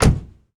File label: door close; Golden Crayon Sounds